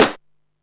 SNARE, Techno 138 BPM

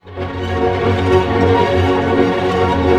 ARP F# MJ PP, STR ARP MAJ; Sound On Sound - Peter Siedleczek's Orchestra

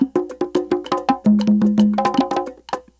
RUN, perc run; Crypton - DrumBase5000

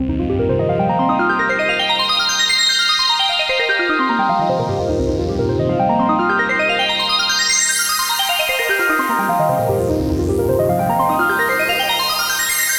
POLYBOX, ARPEGGIO 150; Masterbits - Tekkno Trax

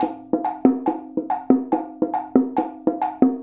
Mallet Conga and Wood Block

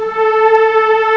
HISTRNG, CMI Factory

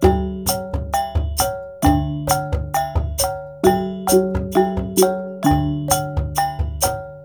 WYANG L, Heart of Asia; Spectrasonics - Heart of Asia CD2; W.KULIT

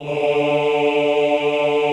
OHH D2, Classical Choir; Best Service; Extended Classical Choir

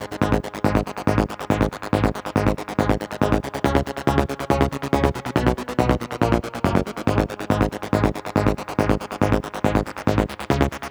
140BPM G#; Vengeance - Essential Clubsounds Vol.4

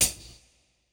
Kit, breakcore Drum Hits

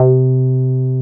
303 SQR C4 MP, SQUARE BS

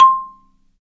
Marimba; Roland LCDP11; Africa VOL-1; Af.Marimbas

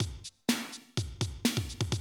125, Classic Drum Loops

